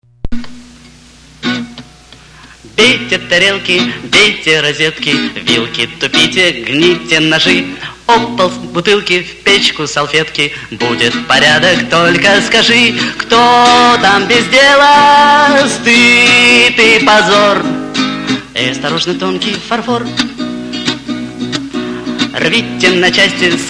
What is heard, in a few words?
dwarves table song